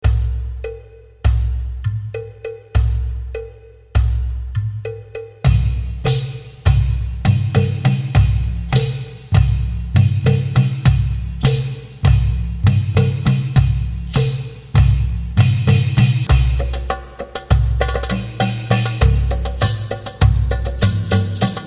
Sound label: Rhythm Kashlima, belly dance